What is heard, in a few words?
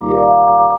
talk box chord